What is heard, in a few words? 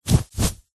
clothing jumper fast short movement flap could be swinging punch; zapsplat